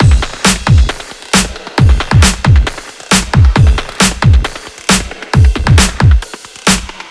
Table Kit; Барабаны

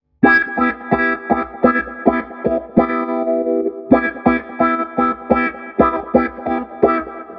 Wahwah; Latin (Латино)

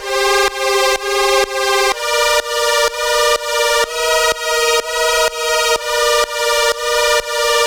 Whirrsing; Атмосферный звук (Minimal-Electro); 125 BPM; атмосферы; Пэды; pad

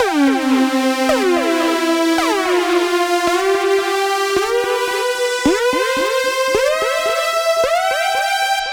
Default Lead

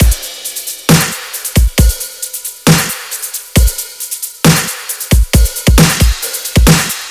Bro Kit B, 135 bpm

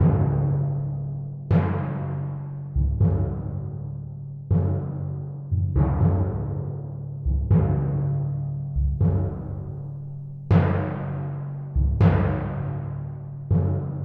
timpani; playful waltz

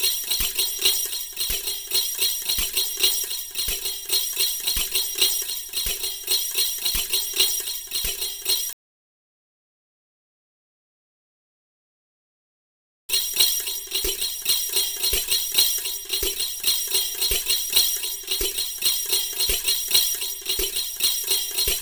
Folk Tambourine 110 BPM; бубен

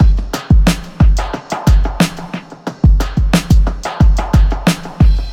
Big Snare; чиллаут

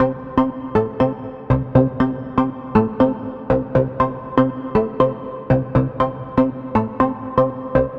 Dance Pluck; Electro Dub

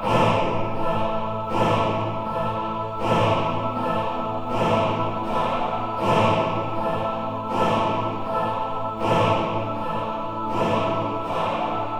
Choir Special, movie; Сэмплы хора (Movie Score); film music; Вокал; 80BPM; Музыка для фильмов

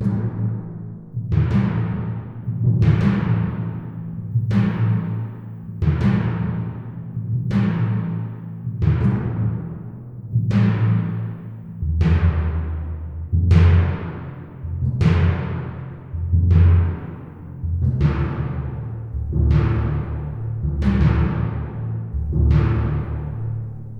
timpani

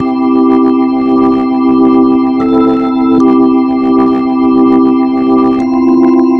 Happy Chords, reggae; регги

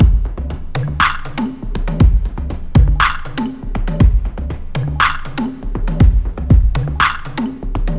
Jungle Kit A; Electro Dub

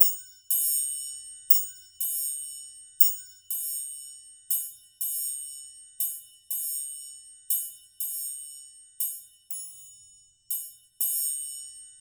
Triangle

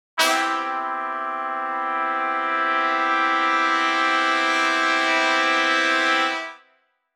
Section, latin

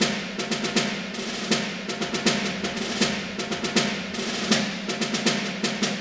Marching Snare

